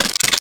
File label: Termite, Wavetables; Cymatics; Serum; Animals for Serum - Gold Edition